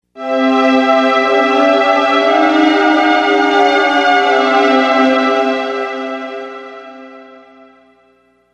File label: Progression, chord progressions